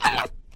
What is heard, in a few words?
Bird Black Collision; fowl